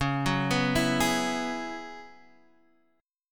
F sharp 7th Suspended 2nd Suspended 4th

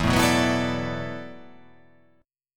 E 7th Suspended 2nd Suspended 4th, down

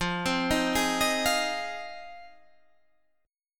F Minor 7th F Guitar Standard; arpeggiated; JGuitar

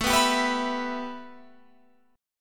A 7th Suspended 2nd Guitar Open F; A7sus2; strummed

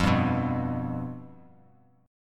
F Suspended 2nd Flat 5th, Bass Standard; strummed; x,8,5,4; JGuitar

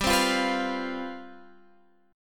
C Major 7th Suspended 4th, G, guitar chord

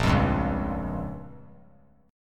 A sharp Minor 6th; strummed; JGuitar

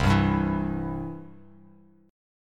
C Major, Bass Standard; strummed; JGuitar

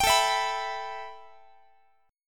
A 7th Suspended 2nd, A, down, Guitar; A7sus2 strummed

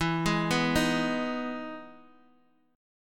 E Major 7th, Guitar, Open G; chord shape

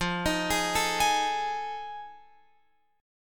F 7th Sharp 9th, Guitar, Open F; F7#9; arpeggiated

